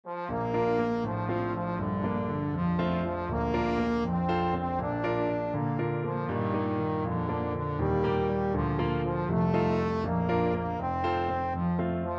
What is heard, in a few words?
brahms 5 lieder o liebliche wangen, trombone